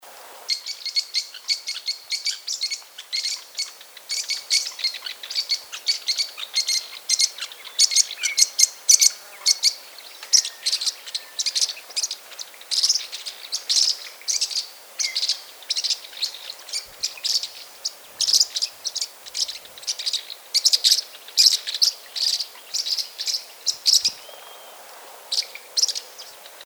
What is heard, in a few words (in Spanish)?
Cotorrita Celestial